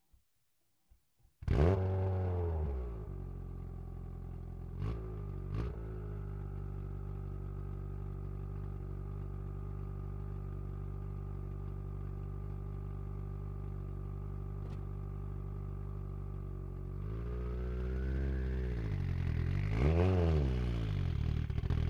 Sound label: Citroen Xsara WRC ONBRD RAMPS Start Drive Stop Various EXHAUST; MKH8040; 2001; Pole Position